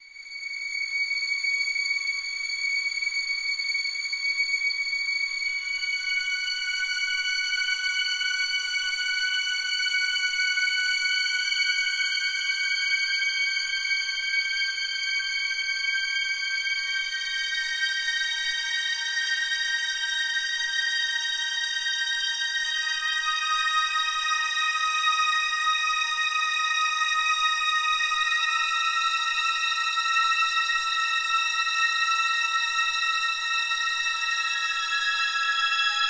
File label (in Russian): muzyka iz trillera strashnaya